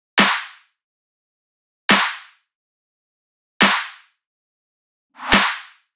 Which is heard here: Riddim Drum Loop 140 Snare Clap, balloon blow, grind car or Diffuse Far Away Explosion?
Riddim Drum Loop 140 Snare Clap